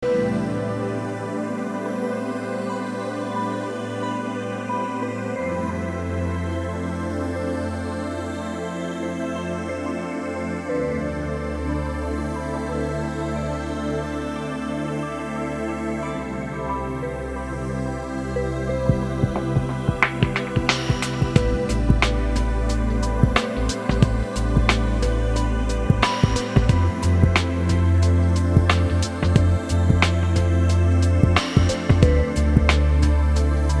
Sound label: Dreaming loop